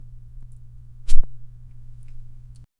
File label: hand shake